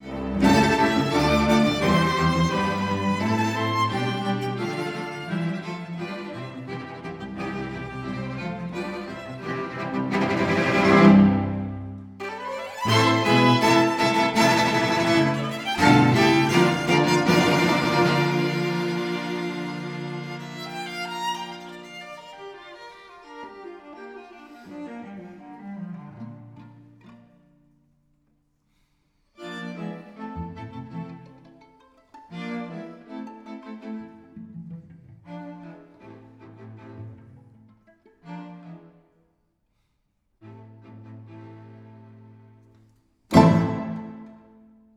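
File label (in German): streichsextett dry, Musik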